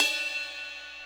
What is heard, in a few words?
Yamaha RX-120 Ride Cymbal Cup